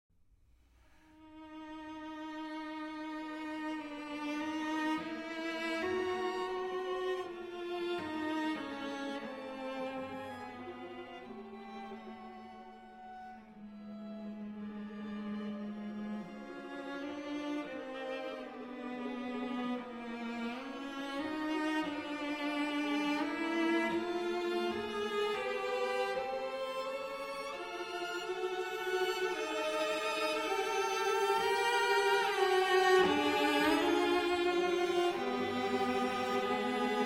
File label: String Quartet No. 6 in D Mesto - Marcia